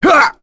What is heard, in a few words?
Vox Attack; Phillop; kingsraid